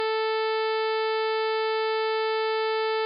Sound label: sawtooth 440; timbre